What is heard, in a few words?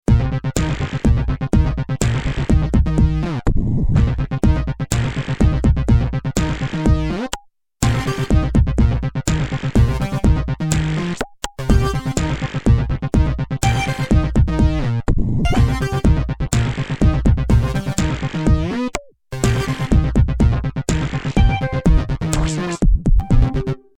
Electro Pattern; Venom